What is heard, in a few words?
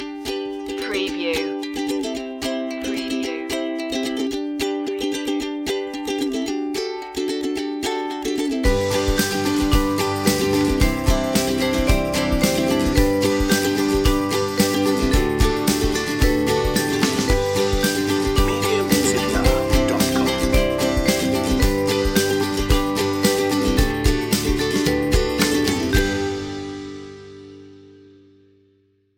no vocals